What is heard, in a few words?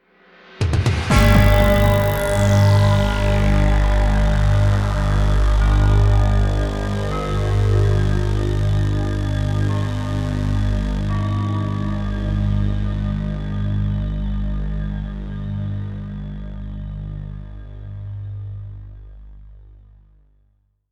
Music One Shot